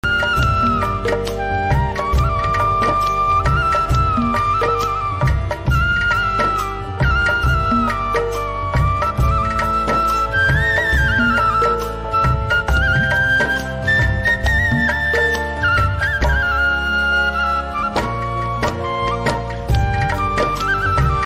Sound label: flute ringtone